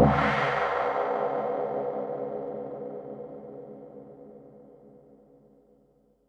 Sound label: HH, Pearl SC-40 Hihats and cymbals